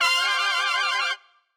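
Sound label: trumpet shake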